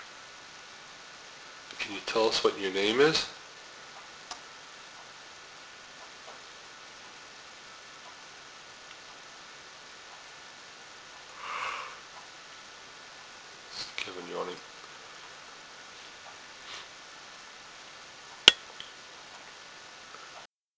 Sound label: evp plink